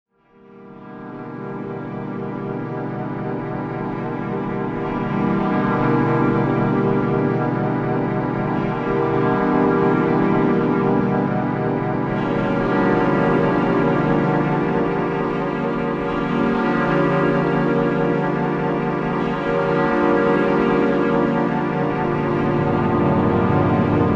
Noise Research

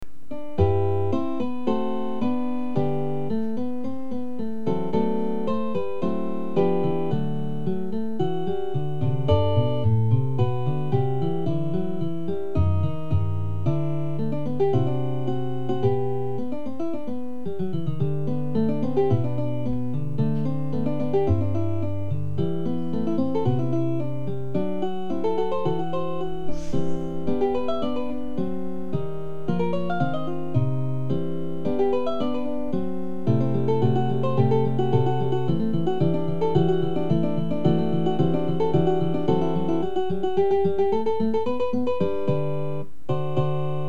Guitar Duet In G

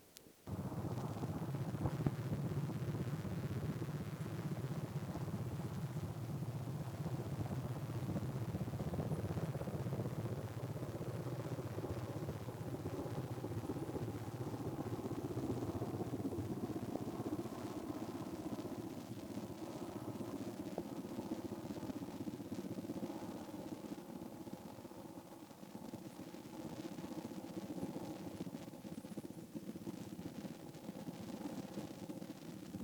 Launch Sound Juno Launch

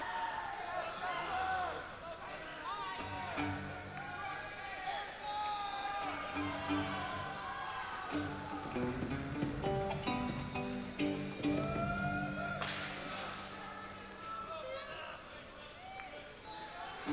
Crowd; sony

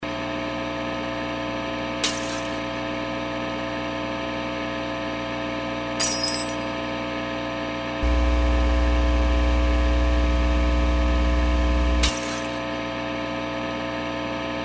noisy

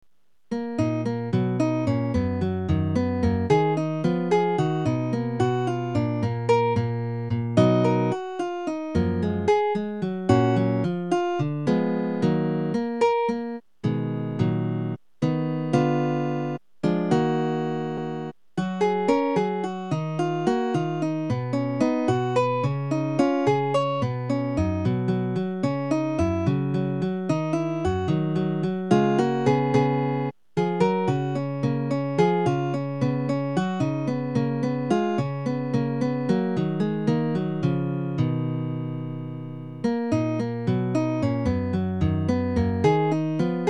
Eb Minor, Short Piece In Eb Minor For Guitar

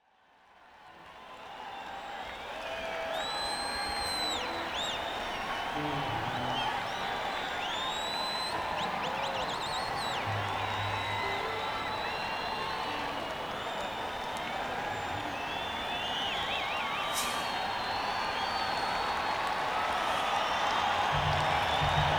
Crowd Tuning

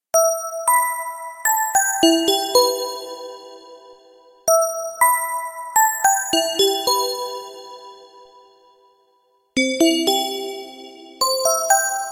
china bell; Kawai K1; Bank 2 card A

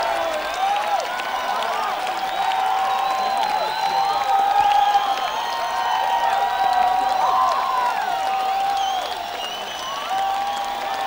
Encore Break

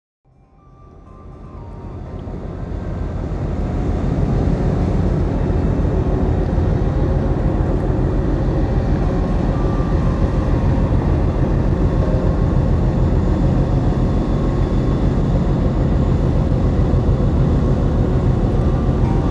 Rhein Night Ambient Field Recording; Ringhausen

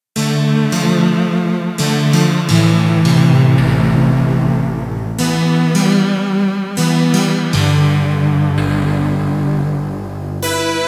Mega Zynth, Kawai K1 preset

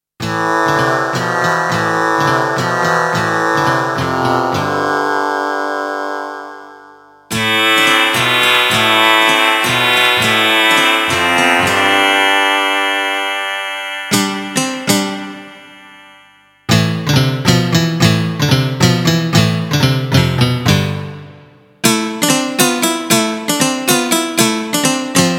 Grasshoruh; Kawai K1; Bank 2 card A

